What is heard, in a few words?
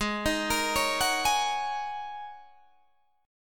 G sharp 7th Suspended 2nd Suspended 4th G sharp Guitar Open D